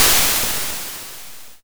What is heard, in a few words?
Snare, YM, CHIPSHOP HITS DRUMS; ChipShop Deluxe